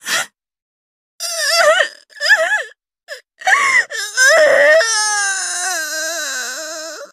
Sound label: cry